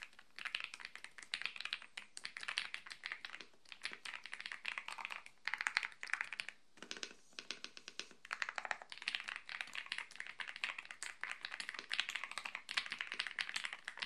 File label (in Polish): dzwiek Epomaker TH99 Pro